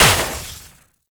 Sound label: Infested Radio Tower Speaker Damage; Warframe; Ambience; NineteenNinetyNine